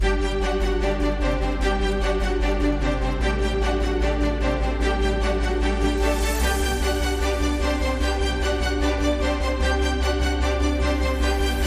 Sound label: Underscore